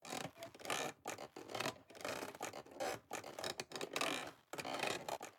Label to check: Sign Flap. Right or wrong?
Right